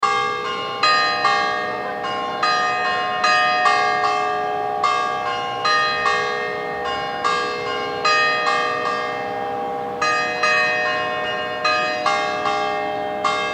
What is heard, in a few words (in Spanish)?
sunchales parroquia san carlos borromeo campanas